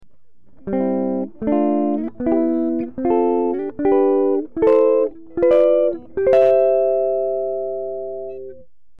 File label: Chord strum, guitar